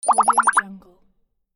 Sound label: digital bubbles; audioheart